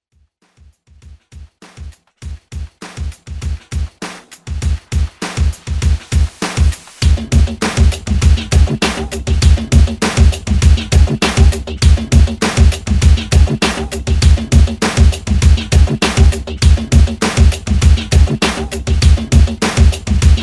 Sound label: Bumba Bass; Bumba Bass Transition Edit